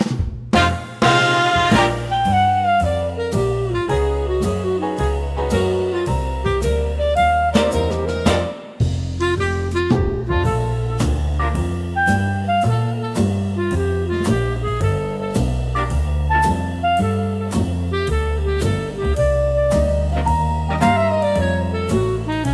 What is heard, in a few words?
Jazz